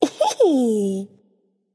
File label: "heh heh"; smite; voicepack; nike